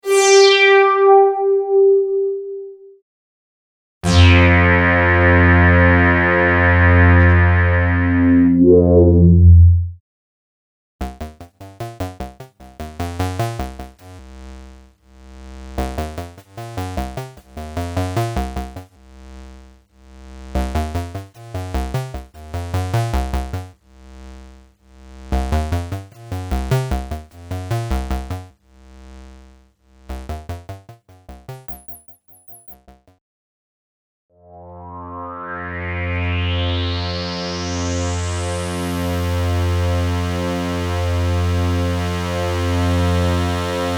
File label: Moog Modular